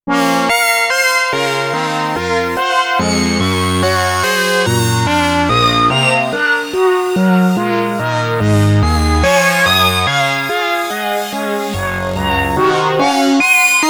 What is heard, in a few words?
Sequential Pro 3 Paraphonic Baroque